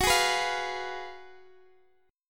F sharp Major 7th Suspended 2nd Suspended 4th, Guitar, DADGAD; F#M7sus2sus4 strummed; x,x,16,13,14,15; chord shape